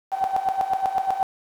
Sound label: SINGLE CYCLE 1K